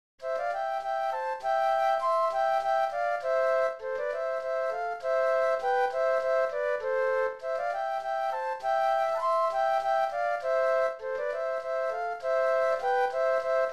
Slavonic Dance Flute Duet; classical; Dvorak; op. 46 no. 1

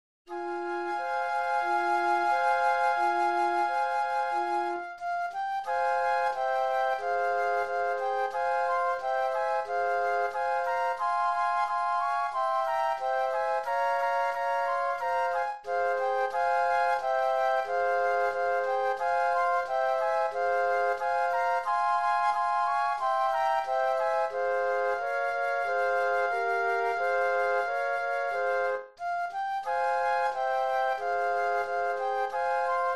Snake Dance Flute Trio

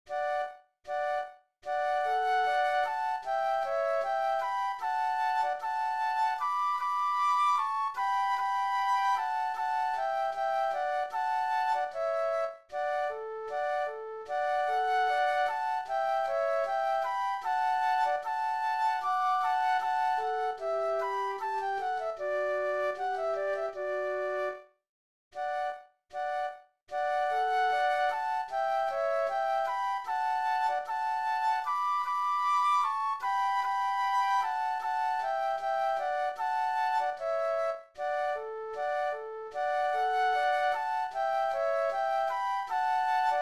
Romance Flute Duet; classical; Mozart; K. 525

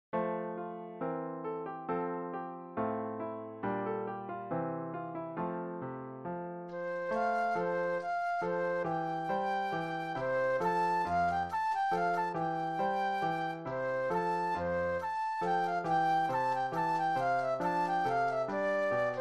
Gavotte Flute Solo; classical; Corelli; op. 5 no. 10

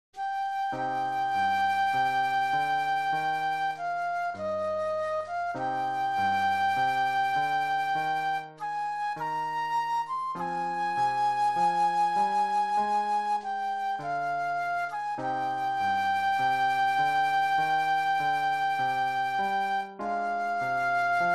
Romance Flute Solo; classical; Bizet

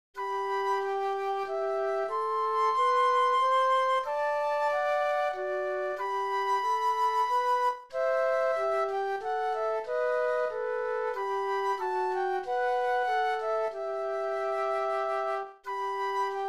Minuet Flute Duet; classical; Krieger

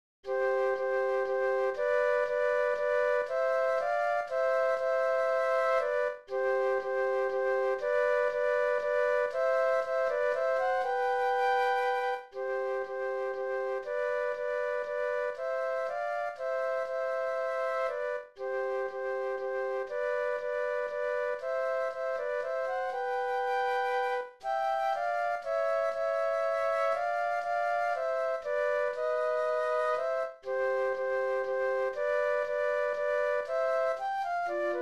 menuetto flute duet; classical; Rossini

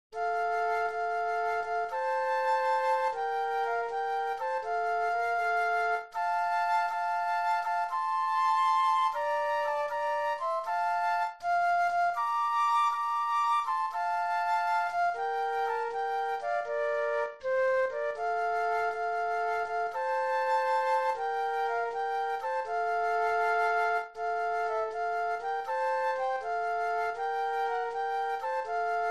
Ten Green Bottles Flute Duet

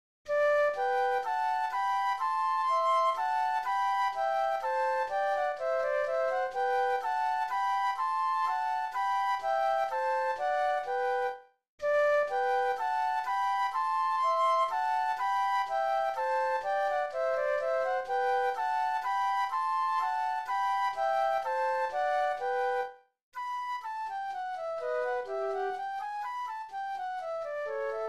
Minuet Flute Duet; classical; Mozart; K. 525

